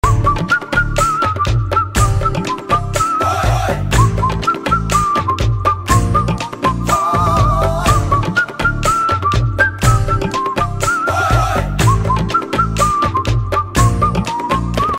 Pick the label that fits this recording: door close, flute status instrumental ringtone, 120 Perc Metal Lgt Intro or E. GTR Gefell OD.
flute status instrumental ringtone